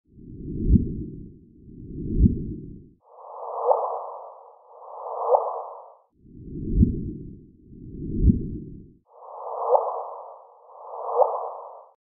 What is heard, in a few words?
LIGO Gravitational Wave Chirp